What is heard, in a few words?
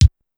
Kick Linn, Pitched Up; Clavia DDrum-AT